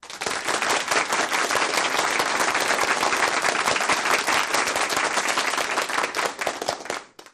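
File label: Applause Medium Crowd Indoor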